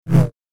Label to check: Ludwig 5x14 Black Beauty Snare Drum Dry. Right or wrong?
Wrong